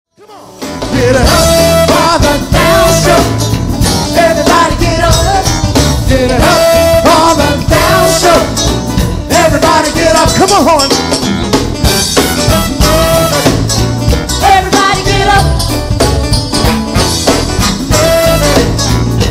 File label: p-funk